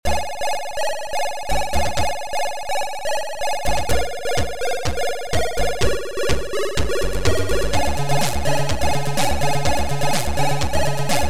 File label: Amiga Chiptune